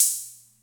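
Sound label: Atlantex Music Percussion Computer hi-hat closed; Atlantex MPC-1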